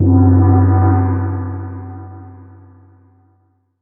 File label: Asian Gong; Cymbals